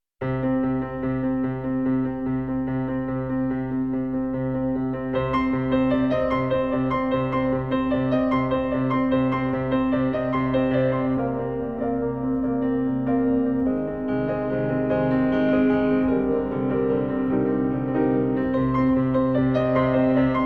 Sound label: etude for piano; nonesuch